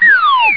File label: Whistle Down Short; oslo